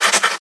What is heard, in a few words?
Scratchy Pencil; angry birds